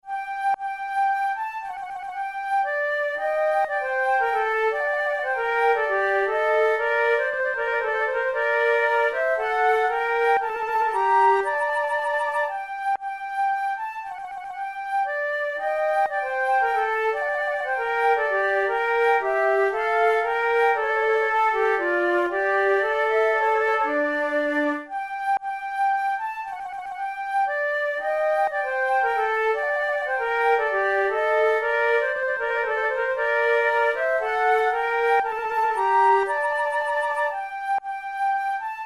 two flutes air

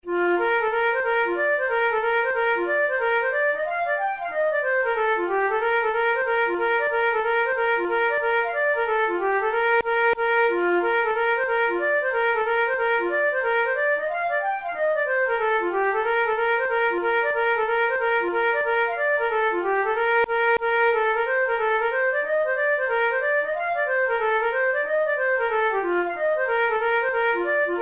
hornpipe, flute tunes

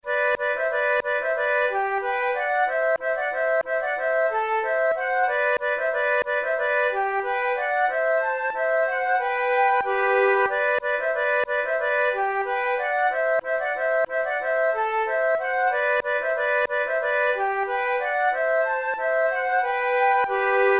march duet, flute; rob roy

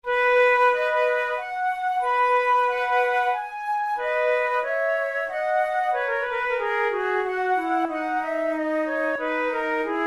prelude, flute

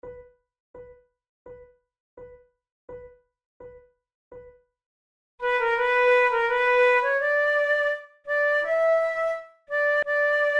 duet, flute tunes; O Charlie is my darling

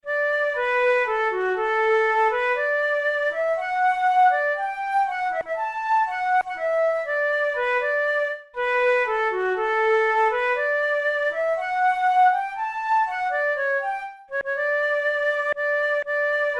bovaglies plaid, flute

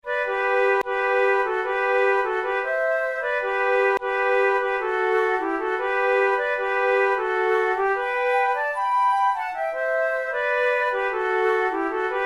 duet

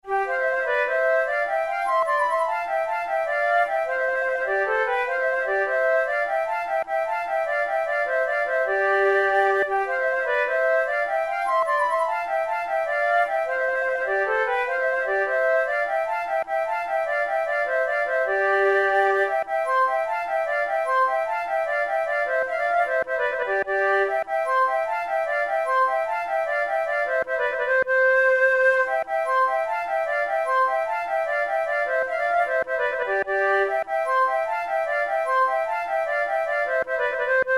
gigues; Naudot; 6 babioles

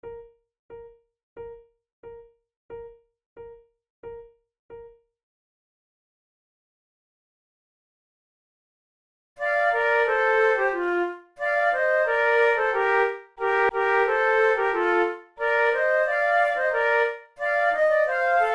flute sonata, andante; Mattheson; flute tunes

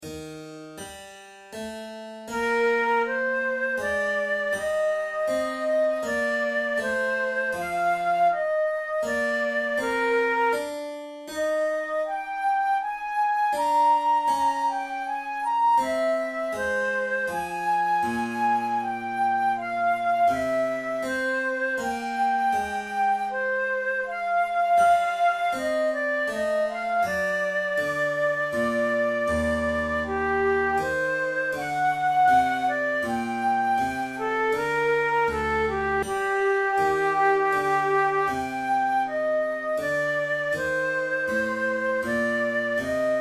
adagio, flute

